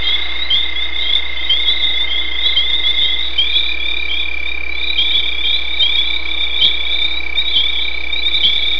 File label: peepers